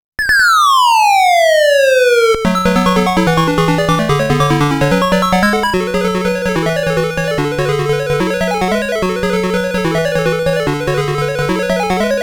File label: 909 BGM; Zoom 909